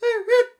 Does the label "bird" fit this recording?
Yes